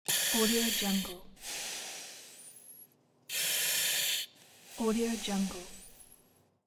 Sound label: breathing in an oxygen mask